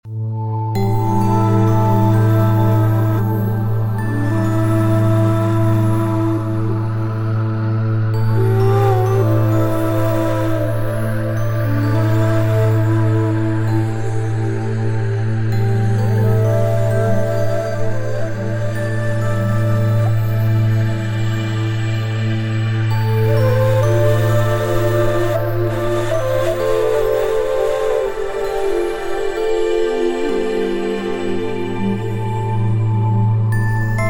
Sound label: morning meditation